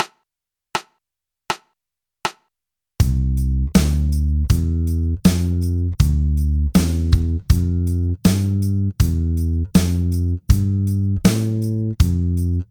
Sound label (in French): gamme maj par 4 80 Bpm